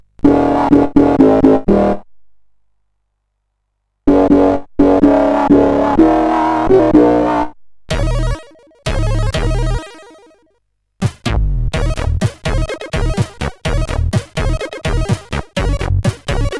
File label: sid CSG8580R5; hvsc